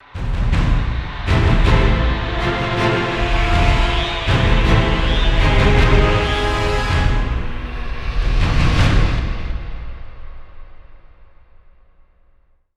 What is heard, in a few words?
Lose; Galaxy Reavers